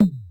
Yamaha RM 50 Toms

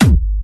Harder, kicks

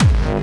Kick n Bass

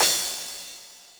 CYMBAL, Yamaha RM 50

